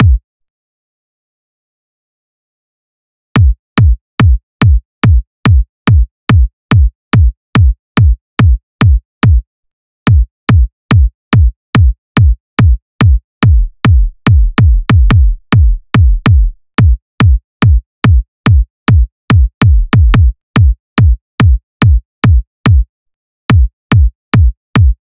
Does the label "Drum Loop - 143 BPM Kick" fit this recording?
Yes